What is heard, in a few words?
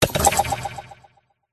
playful game pop off glitch robotic technology hi tech futuristic game tone; science; ufo; space